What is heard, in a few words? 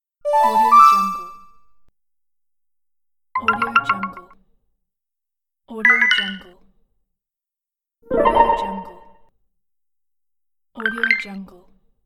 social media good event notifiers